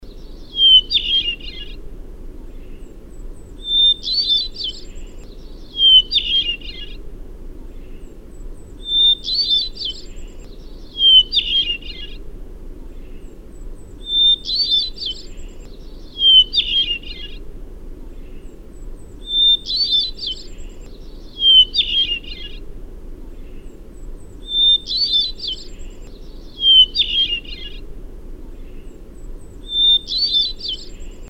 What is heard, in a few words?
Hermit Thrush; Thrushes